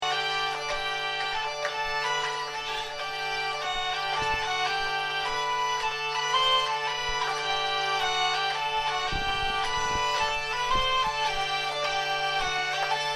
avant deux contre chant; avant deux meziere

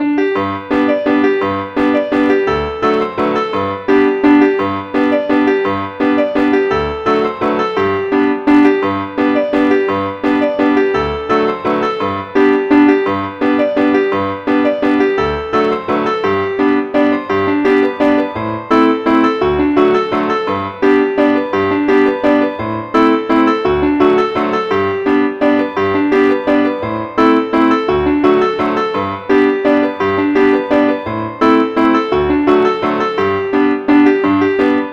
mazurka

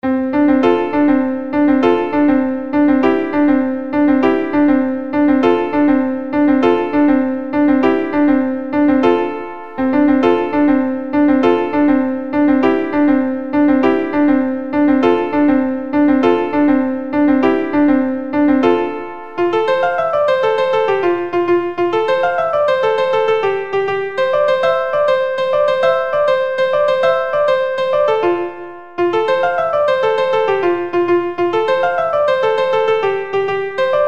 zideco

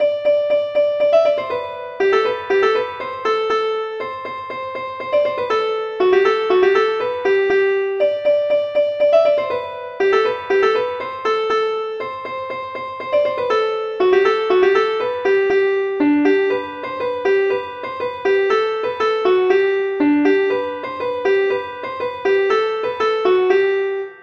polka

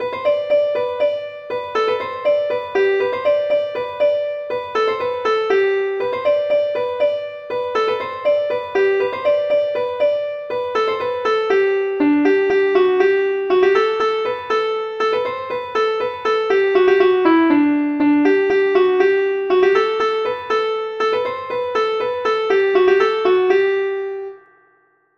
bourree

